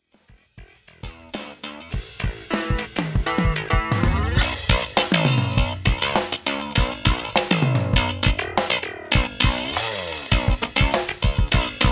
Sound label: Jazz Amp